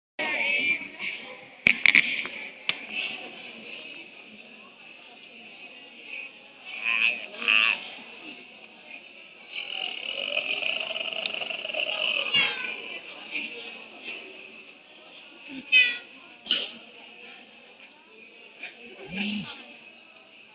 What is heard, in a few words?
lecture hall